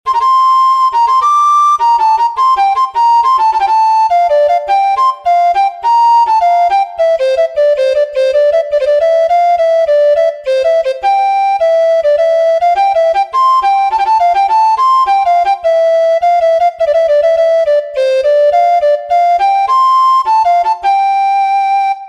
march for open place in F major for soprano recorder